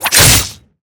Fierce Attack; MUDevs S20.1-3

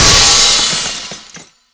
Ball Crash; Ferea; AmoroBall

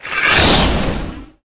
attack; Selupan

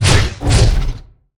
attack; Goloch; Karutan

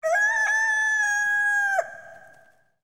Chicken DMG; MUDevs S20.1-3

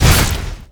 Hit; Lord Silvester

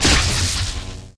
Exp Electric; MUDevs S20.1-3

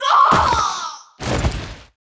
Devil sorcerer death; Karda Mahal Underground Temple

